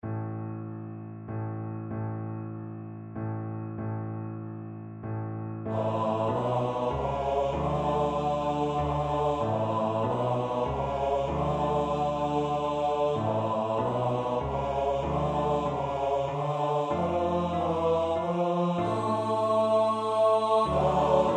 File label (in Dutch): Eindelijk komt men 's avonds, S-A-T-B; 6c.Eindelijk komt men 's avonds